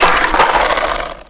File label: bail, skater; Tony Hawk's Project 8; XBOX